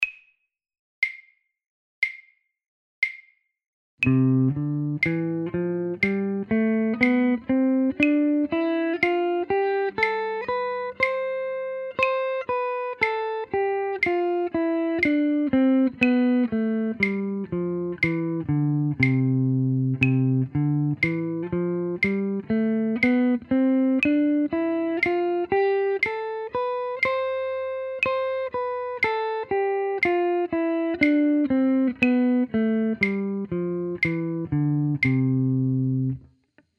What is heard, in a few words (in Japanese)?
doremi rensyu